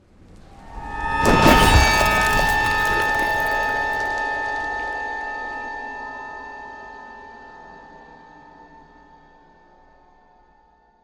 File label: Ice Spell Whoosh Frozen Pierce, Cold Whooshes; Arctic Aura